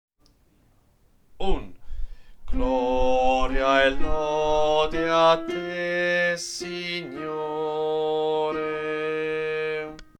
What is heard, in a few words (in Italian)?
Gloria e lode contralti, coro